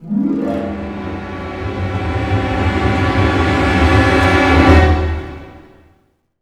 Orchestral Loops; 128 PSYCHO

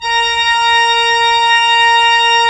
ORG, CHURCH ORGAN; Roland - LA Composer vol. 1

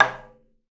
Wadaiko Rim, Taiko, Percussion; Roland BK-5

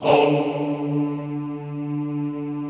HOMMM D2, Classical Choir; Best Service

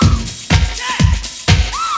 REEDIT LOOP, breakbeats; Crypton - DrumBase5000

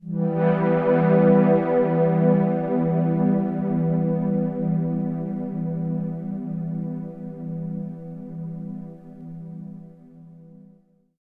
PAD R; Best Service Advanced Media Trax Building Blocks; SIERRA

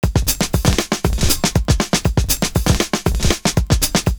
FULL BEAT, loop

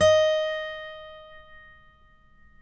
D#, guitars; Spectrasonics; Hans Zimmer Guitars VOL-2; FNGSTYLE PFM

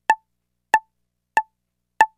String

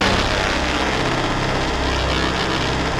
basses; Wizoo - Powered Wave

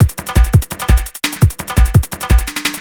Beat, Industrial

